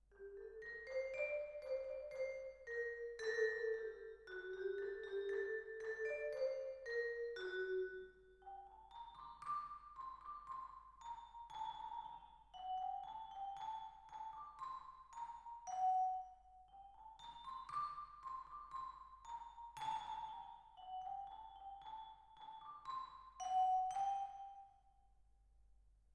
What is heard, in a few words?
Twin R Vibra